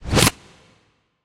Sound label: space tile pickup